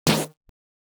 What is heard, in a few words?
ambient